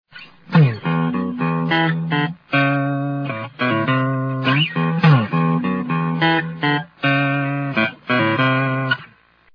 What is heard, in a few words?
music